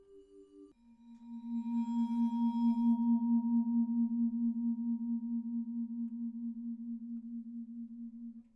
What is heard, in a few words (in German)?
Vibraphon Bow G